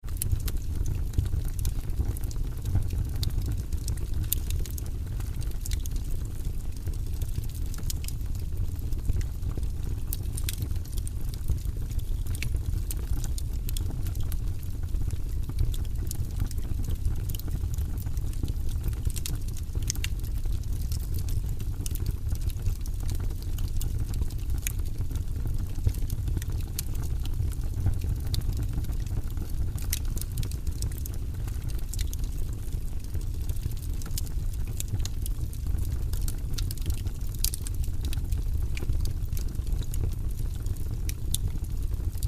Campfire burning with a quiet ripple

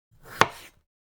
Wooden cutting board single chop

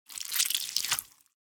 Knife or dagger flesh moves inside

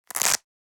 Velcro wallet open; purse

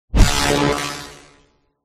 Electric lance cast

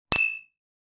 Wine glass cheers and toasting

